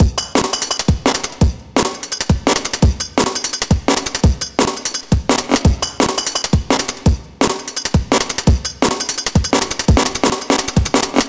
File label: Verb Cut Beat, Electronic Beats; KJ Sawka; Drum n Bass & Beyond